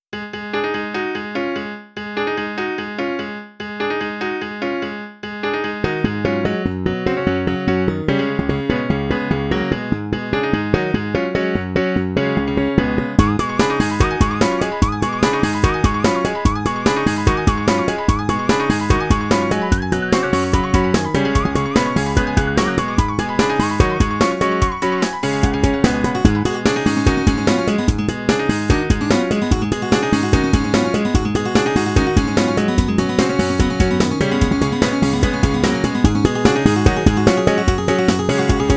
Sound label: Limbomusic delay rock